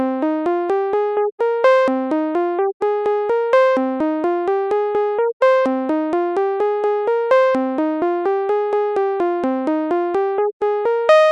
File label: synth cutoff rebuilt